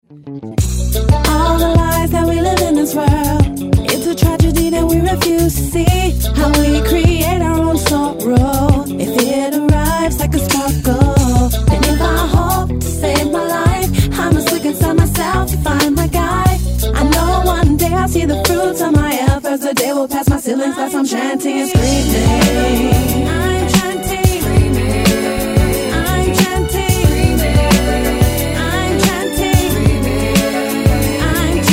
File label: screaming